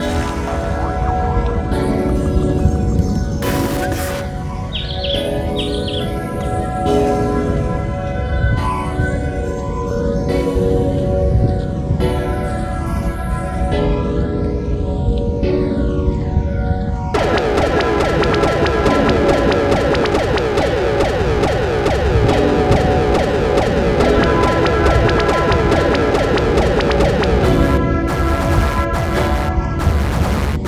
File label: sirens in unison